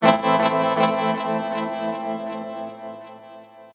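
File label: Am Tremolo Guitar Lick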